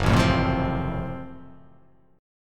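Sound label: Gb Minor Major 7th Flat 5th Gb, 8 string; strummed; 2,0,3,2,0,0,2,x; JGuitar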